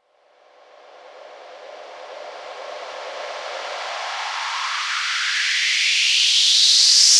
FX Uplifter

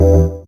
Synth Shots; Vengeance Samples

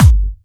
Bassdrums UK Trance Kicks; Vengeance Samples; VEC2 Bassdrums

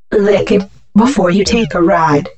Vocal Phrases; Vengeance Samples; Vocals

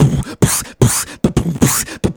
Beatbox Loops, Human Beatbox

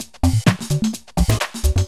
Houseloops 128BPM; Vengeance Samples

